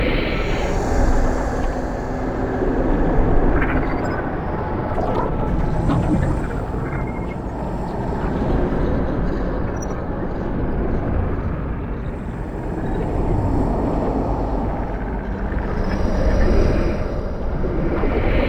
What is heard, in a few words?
Death Loop; Warframe; Tenno